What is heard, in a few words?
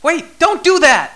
scared